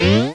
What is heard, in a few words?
checkpoint